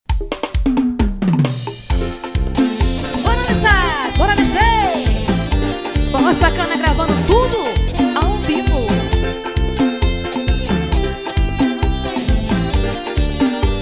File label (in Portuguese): forró ao vivo águas de oceano